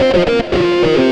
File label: xtra lead